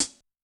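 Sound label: Rock Close, HiHat, Yamaha DTXpress-II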